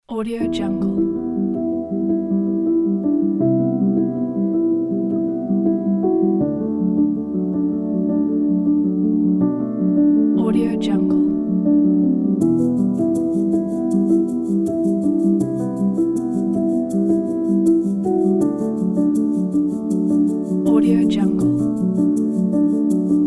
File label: inspirational